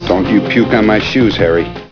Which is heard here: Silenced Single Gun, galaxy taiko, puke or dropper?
puke